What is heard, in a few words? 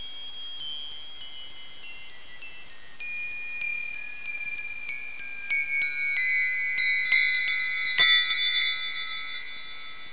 chimes